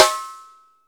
Trebly Snare Drum Sound E Key, steel snare drum sample tuned to the E note